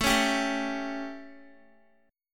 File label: A 7th Guitar, chord; strummed